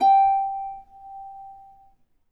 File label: G4 HARP; USB Soundscan vol.62; AKAI